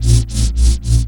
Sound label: 165, Bb, Keyboards; Total Drum&Bass; 90 s Sample CDs